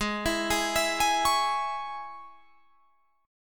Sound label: G sharp Suspended 4th Sharp 5th G sharp, Guitar Drop D; 18,19,18,21,21,21